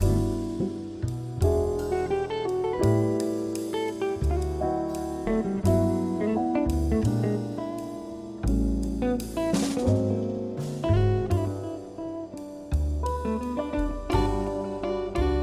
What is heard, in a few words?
Background Jazz Music